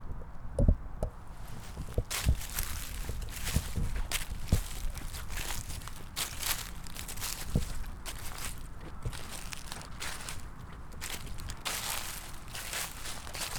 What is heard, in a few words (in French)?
feuilles mortes, campus universitaire; campus universitaire de la bouloie